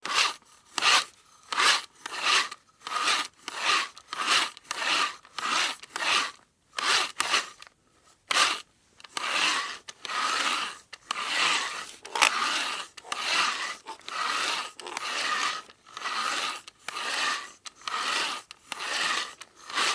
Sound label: manual drill